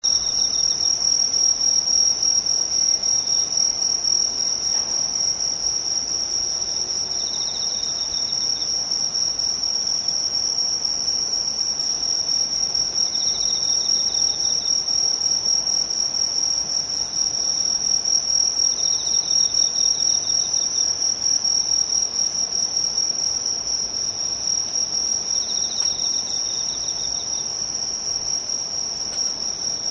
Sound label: bug